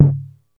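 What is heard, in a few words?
hi tom, drum machines, Roland CR-8000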